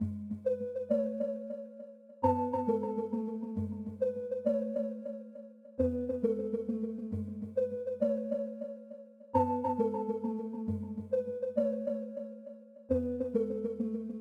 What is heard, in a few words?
135 BPM F# Min Keys; Cymatics; 2022 Melody Collection; Eco